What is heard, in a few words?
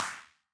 Wider Clap